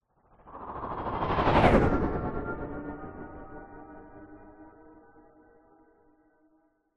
science fiction hovering vehicle pass by fast

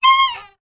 wood pole turn squeak; foley; zapsplat

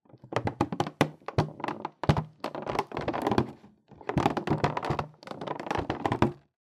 foley plastic tub pressure creak; zapsplat

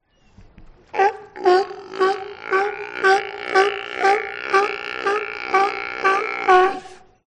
Sea Lion; audio hero

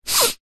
human sniff hard blocked nose; zapsplat

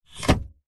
car brake pedal release with foot engine off; vehicles; zapsplat

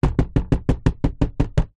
plastic panel knocks hand fast

